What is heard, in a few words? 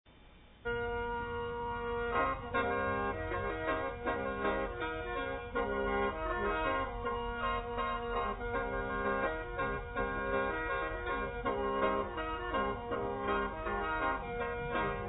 Khaen song, Lao Khaen Music